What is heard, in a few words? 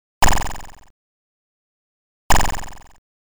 Tracking Missile; Oretachi Game Center Zoku; Time Pilot